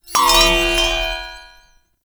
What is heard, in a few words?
Enemy Movement, Foley; Warframe; Follie Frame